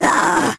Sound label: grabbed; Crypt of the NecroDancer